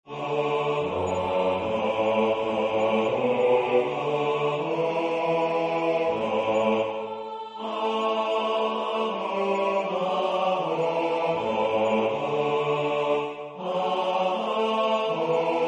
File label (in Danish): bas; Waldesnacht